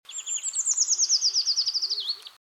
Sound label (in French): grimpereau des bois